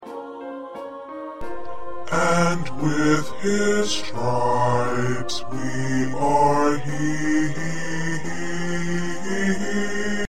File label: Bass